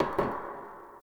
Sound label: Bar Glass Move; Nineteen Ninety Nine; Hub; Hub Pub